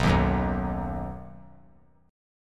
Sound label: B Major, 3C,3F,3A,3D; strummed; 11,10,9,9; JGuitar